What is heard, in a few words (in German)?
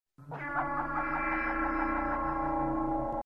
musik